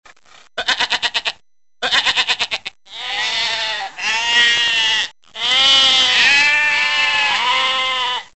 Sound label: Sheepsound